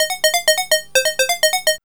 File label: SEQ BLP